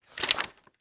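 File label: ui book page backward; Counter Strike Model Pack; CS:GO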